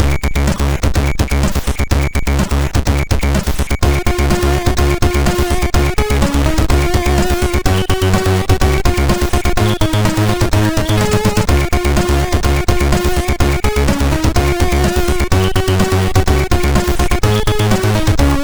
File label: Christmas tune, sid, MOS6581R2